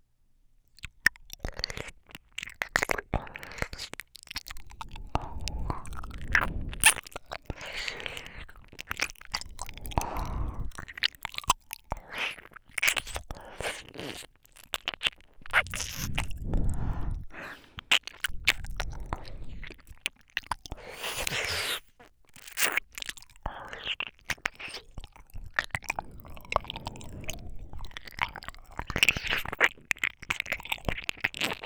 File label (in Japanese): なぶるような耳舐め